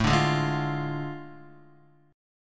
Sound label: A Major 7th Suspended 4th Sharp 5th, Guitar; AM7sus4#5; strummed; JGuitar